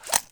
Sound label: holster in, firearms